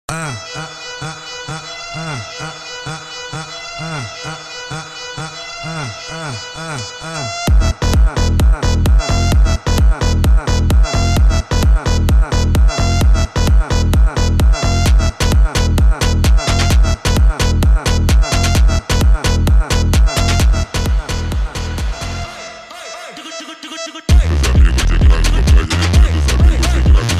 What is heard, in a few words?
Brazil Phonk